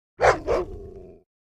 Dog Notification Tone

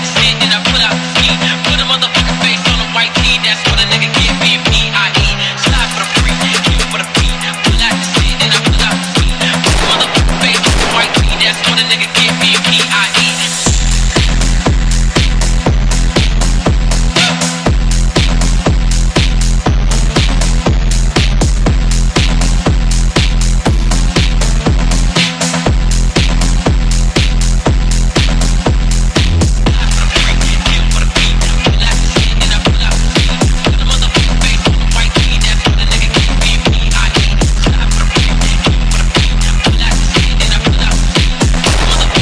PHONK Ringtone

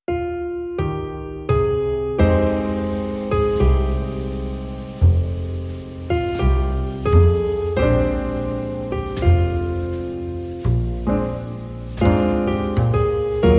Oppo A5s Ringtone; In Love

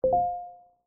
Notification Ringtone; Samsung A03 Core

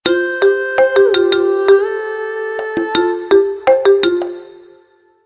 Wood Sound Remix Ringtone

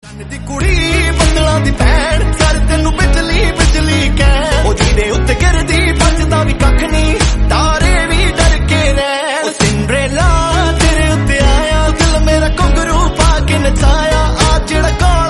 Punjabi Ringtone

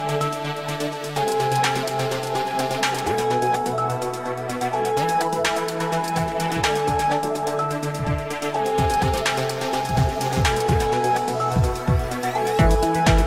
Up Electronic Ringtone

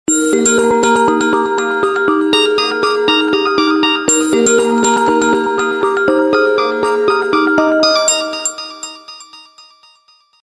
Oppo Original Ringtone

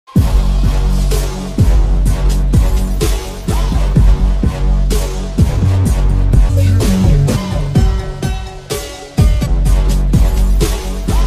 OPPO A71 Remix Ringtone

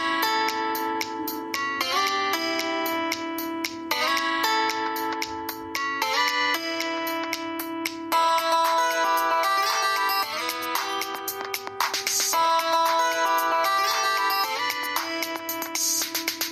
Huawei Vitality Original Ringtone

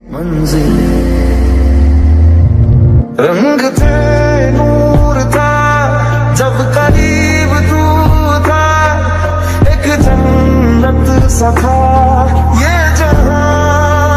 Sad Ringtone

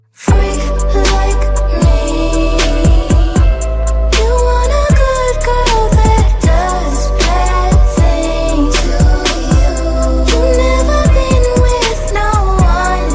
English Ringtone

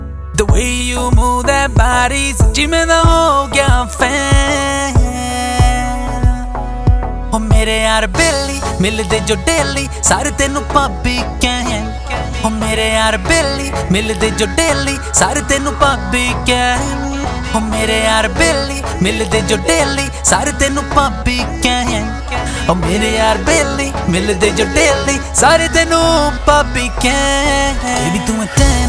best Punjabi Top Song Ringtones

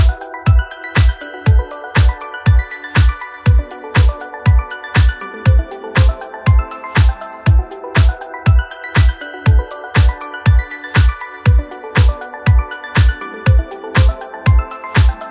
Techno Crystals Ringtone; Samsung

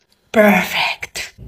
Perfect Notification

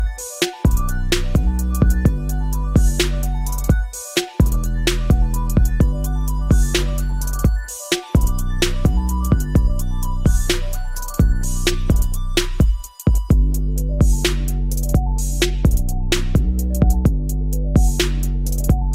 Infinix Ringtone

